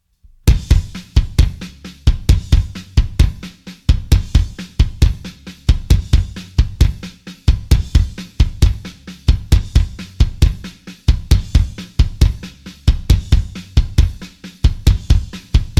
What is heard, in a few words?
drums